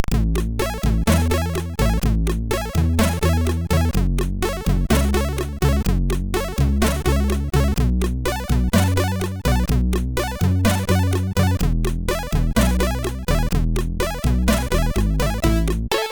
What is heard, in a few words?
Bossa Nova, SIDTunes; Worktunes